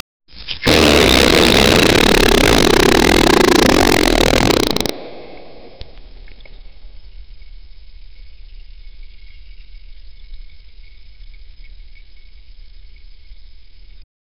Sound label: a long loud fart; gross